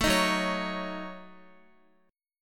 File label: Gb Minor 6th, Guitar; Gbm6; strummed; x,x,7,8,6,8